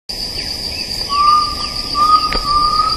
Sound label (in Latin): Crypturellus undulatus; PN Chaco